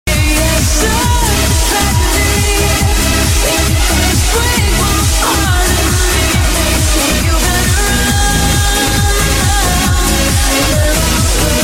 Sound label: Trance